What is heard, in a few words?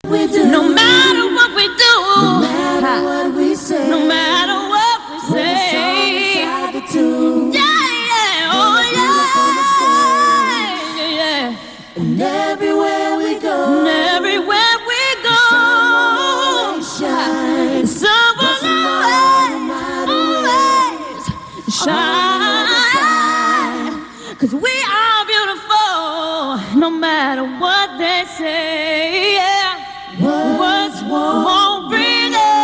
beautiful acapella